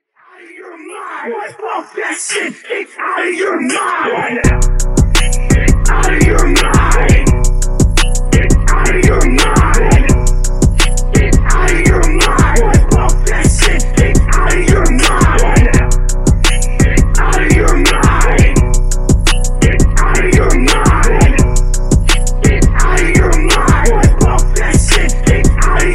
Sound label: Intro Outro Hype 85Bpm